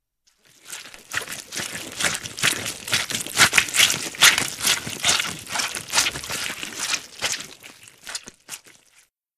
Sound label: Cutting Flesh, gore stabs